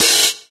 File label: open hat C key, open hi hat sample tuned to the C note. Loudest frequency: 5401Hz; drum single hits one shots